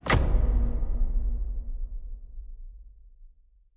Special Click; Fantasy Interface; Fantasy Sounds Bundle

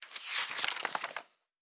Book Page; Fantasy Sounds Bundle

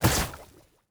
Nature Spell, Magic Sounds; Fantasy Sounds Bundle

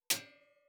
Metal Hit

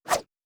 Whoosh; Fantasy Sounds Bundle

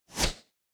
Arrow Fly; Fantasy Sounds Bundle; Medieval Combat Sounds

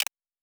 UI Click; Fantasy Interface; Fantasy Sounds Bundle

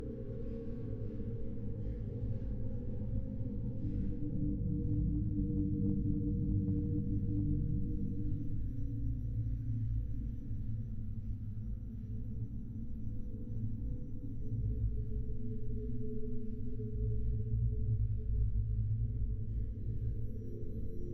Cave Loop, Ambient; Fantasy Sounds Bundle

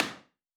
Nail; Fantasy Sounds Bundle; Craft

